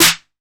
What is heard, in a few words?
808 snare; SNARES; Wizoo - Platinum 24 Electronic